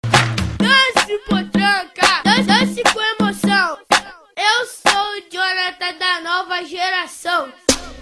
funk

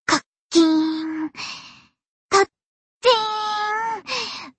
kachin long

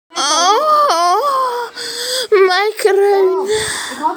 ahhhh kreunnnn

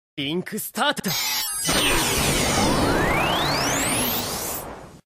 log in screen; sao

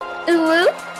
uwu

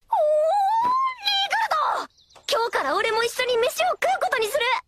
ooh; rimuru

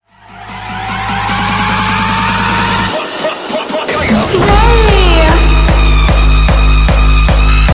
yay beat drop

